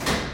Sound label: door closing